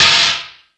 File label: More Cymbals, E-MU Pro-Cussion